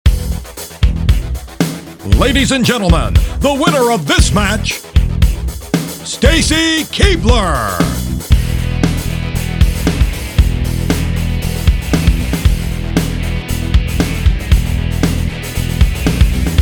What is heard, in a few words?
Victory; WWE Crush Hour; Stacy Keibler